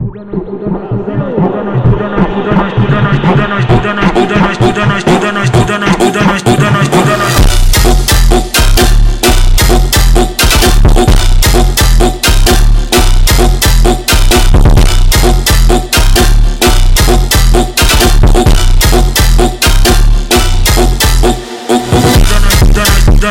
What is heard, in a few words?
Phonk Music; فانک phonk